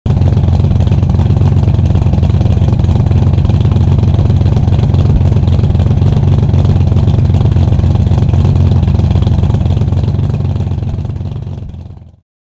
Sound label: 750bhp Skyline idling; Fastcar Magazine; RK Tuning